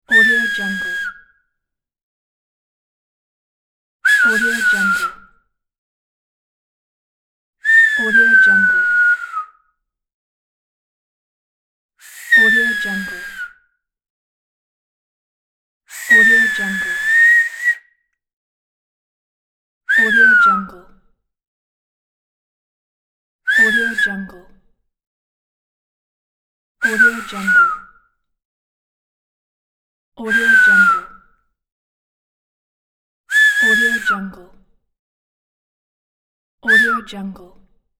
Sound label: whistle finger pack